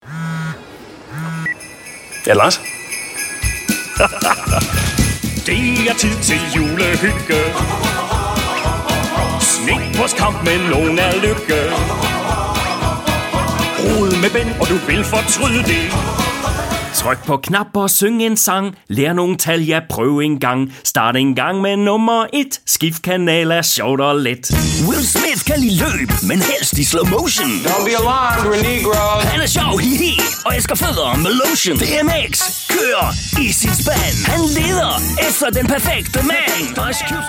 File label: danish male singing